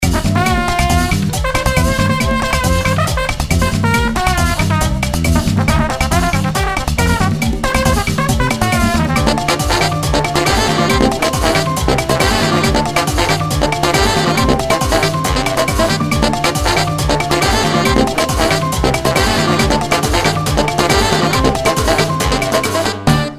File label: Merengue